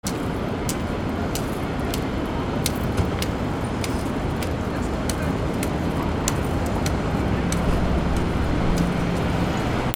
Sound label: AMBIENCE